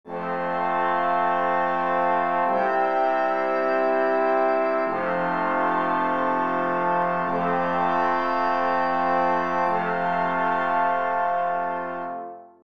trump chord; Nikolai Rimsky Korsakov

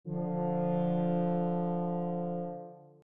harmony unison; Nikolai Rimsky Korsakov

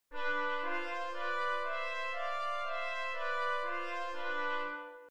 brass harmony; Nikolai Rimsky Korsakov

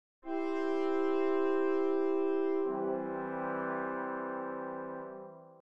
4-part brass; Nikolai Rimsky Korsakov

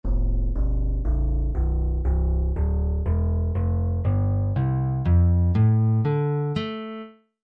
lute; AJ Hipkins

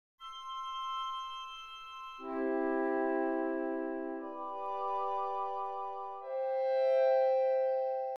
woodwind; Nikolai Rimsky Korsakov

